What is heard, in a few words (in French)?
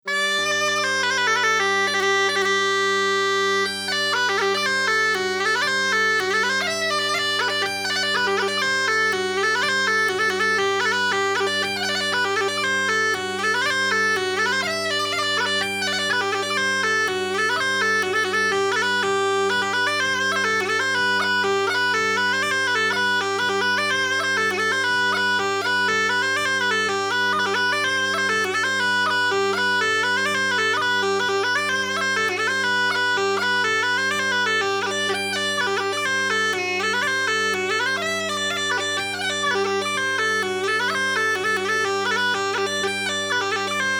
Polka; Polka de Chabassier